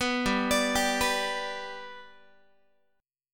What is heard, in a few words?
G Major G down Guitar 4A 4E 5A 5D 5G sharp 6C sharp; arpeggiated